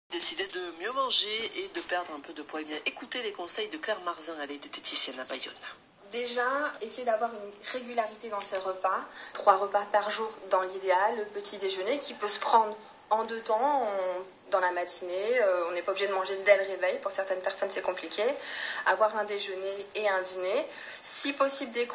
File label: Vocal